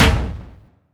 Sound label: Percussions